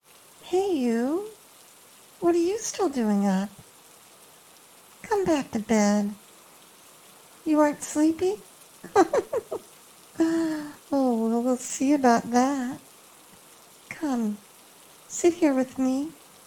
Rainspotting Sleep in the Rain

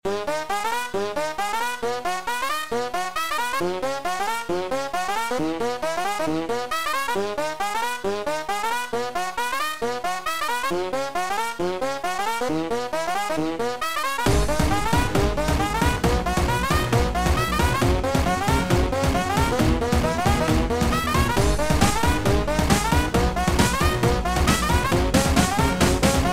Rock My Trombone

